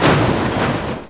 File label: ROLL, Prangs